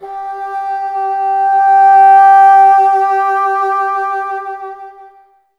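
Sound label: wind bassoon G; Miroslav Vitous - Solo Instruments VOL-1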